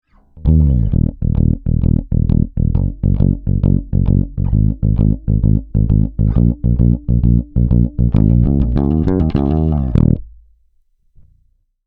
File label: Bassline EQ